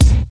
acoustic rap kickdrum sound b key, Royality free kick sample tuned to the B note